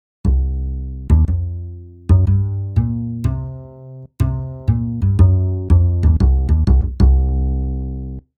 impro contrebasse; pour les petits; loto sonore

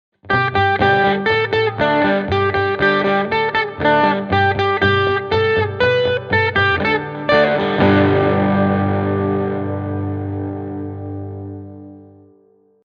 guitare elec; promenons nous dans les bois

